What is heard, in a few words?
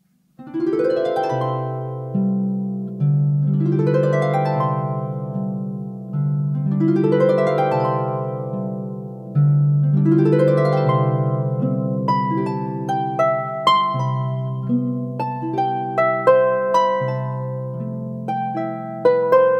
Harp Music; Wedding Gallery